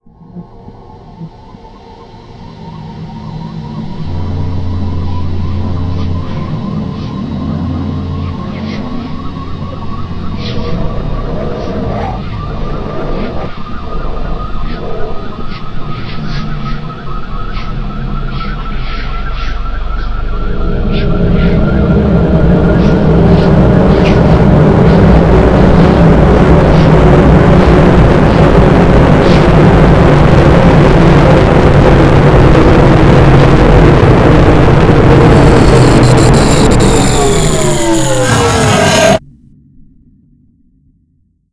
redmatter explo

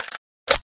p90 mag in; weapons

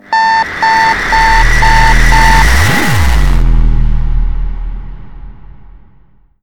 hypersonic, explosions special

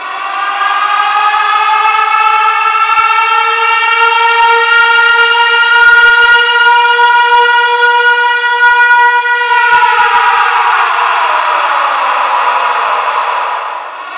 airraid siren; sirens

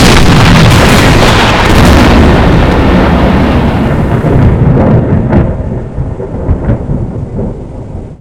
detonate, nuclear explosions; tsar

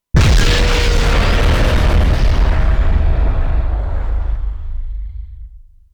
explosion

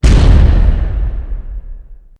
small explosion, light bomb; explosions

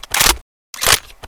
m249 cover up; weapons

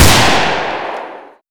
p90; weapons

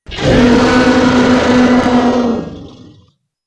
unholy roar

